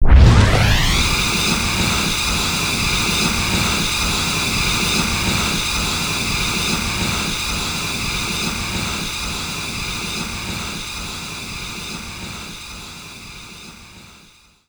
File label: Bigbang Vacuum; Puyo Puyo Puzzle Pop; iOS, macOS, mobile